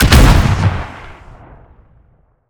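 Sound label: concussion explosion body; gmod; viper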